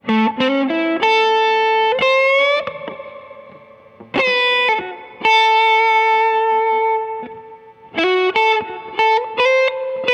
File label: solo; LP Headstrong